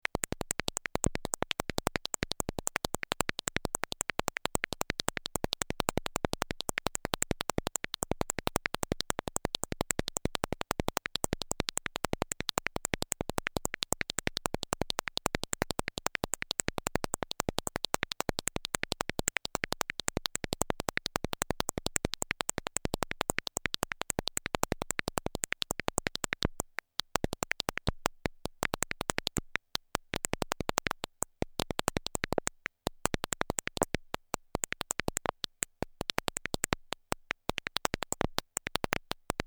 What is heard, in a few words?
Nature